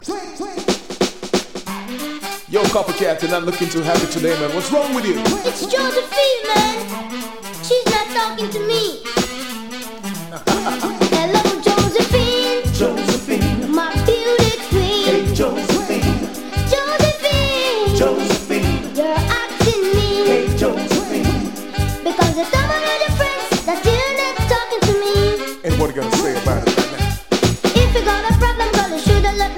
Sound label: swing riddim